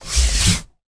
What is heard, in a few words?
car steering wheel hand rub movement